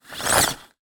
animals dog leash lead extendable retractable short pull